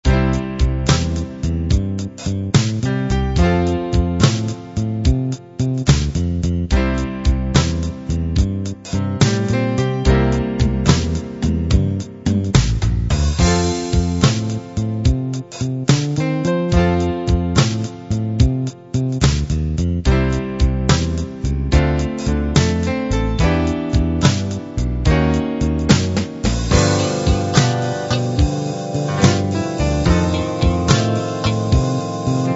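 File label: 68 blues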